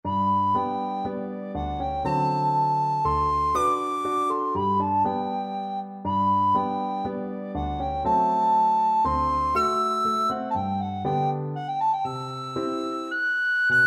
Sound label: recorder